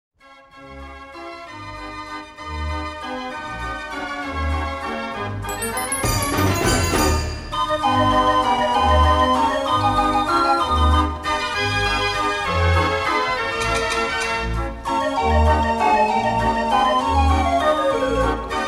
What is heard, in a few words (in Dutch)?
Daar Bij Die Molen, draaiorgel; de arabier de turk de gouwe de sik